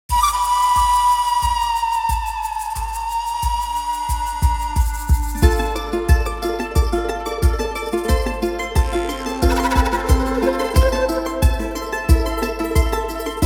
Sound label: andean bundle; south america series; tribe instruments